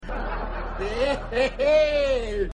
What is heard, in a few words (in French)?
voldemort rire